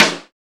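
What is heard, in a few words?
SD, snare drum; X-Static Goldmine 1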